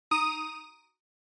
Quiz Button; クイズ

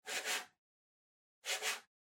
PC Mouse Move Far Hard Pad Short

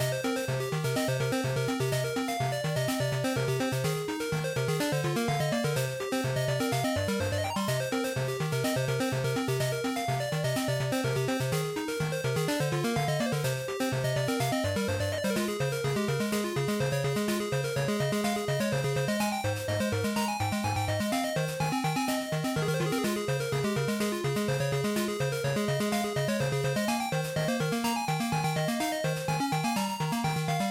GB Action Stage3 Loop125

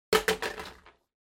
Tabletop Timer Digital Drop; 卓上タイマー デジタル01-15(落とす); タイマー機器

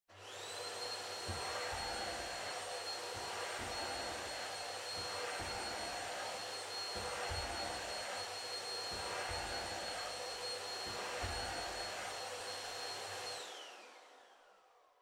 Vacuum Cleaner Far Vacuum Floor

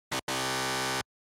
Quiz Buzzer Multi; クイズ ブザー

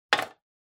Pencil Case Put Down

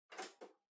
Portable Stereo Far Tape Play

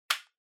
Handheld Transceiver Antenna Rotate Return

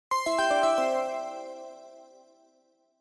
Chime Announce

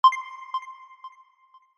Accent

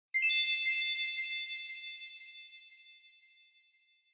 Bell Accent Chime Delay

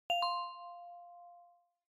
Smartphone UI Sound Dry

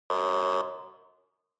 Police Car Siren Close Buzzer

